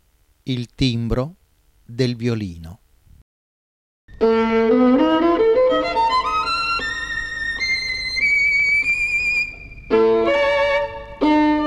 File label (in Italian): Violino, il timbro del violino